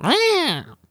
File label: Cat spawn